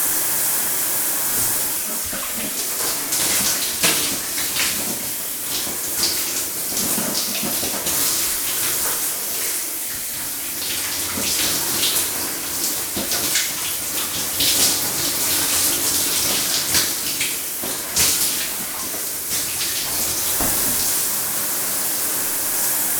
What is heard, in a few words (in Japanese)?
シャワーの音; 日常生活の効果音